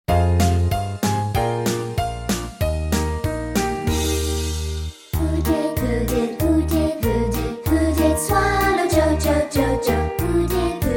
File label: Childrens Choir